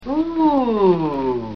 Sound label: oooo